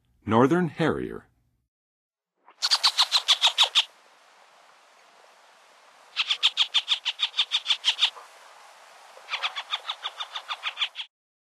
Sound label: Northern Harrier, Birds of North America; Western USA; Western USA Songbirds